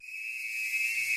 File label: Referee Whistle